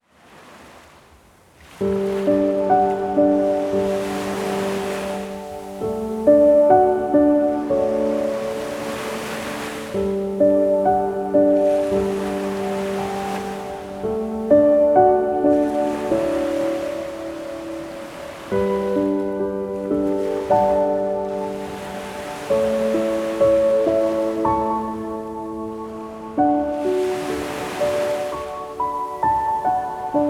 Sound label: piano chilled ocean waves